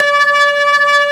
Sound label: B3 Lo Dist Slow C4